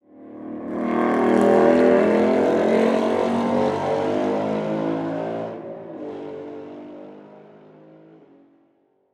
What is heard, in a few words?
Speedway